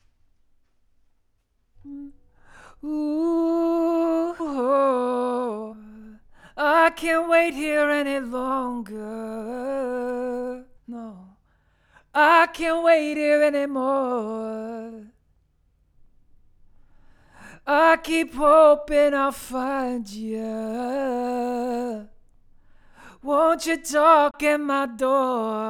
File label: Vocal